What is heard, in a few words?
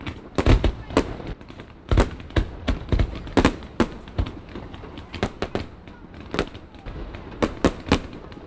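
fireworks; illumination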